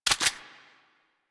Pistol Reload Start; Weapons; Warframe; Tenno; Priest Pistol

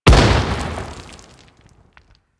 Teralyst Close Step; Enemies; Steps; Warframe; Eidolon

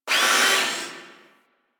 Robot Repair Arm; Ambience; Props; Warframe; Corpus Gas City

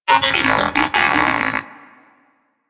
Greeting, Moa Pet Tenacious; Warframe; Pets

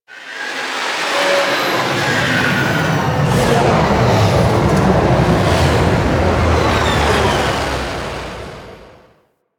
Crp Ship Fighter Thruster Lift Off Long Land B; Ambience; Props; Warframe; Corpus Ship Remaster

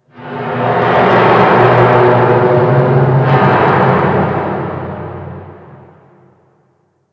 Ocean Low Creak; Warframe; Grineer Ocean

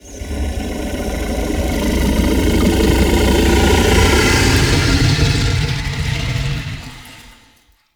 Inhale; Enemies; Sleep; Warframe; Infested; Pit Monster

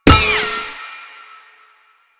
Shield Impact; Enemies; Lasrian Tank; Warframe

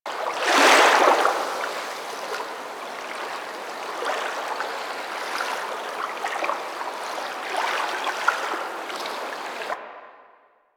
Soft Waves Loop, Beach; Ambience; Warframe; Grineer Beach

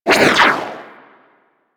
Universe Ring Bounce; Warframe; Warframes; Nezha

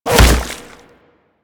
Regurgitate Proj Impact; DE; Warframe; Warframes; Grendel

